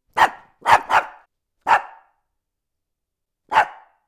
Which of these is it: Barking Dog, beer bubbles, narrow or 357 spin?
Barking Dog